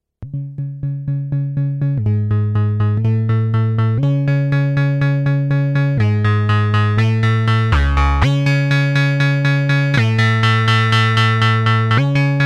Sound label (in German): Mini Brute Walking Metalizer Nachbau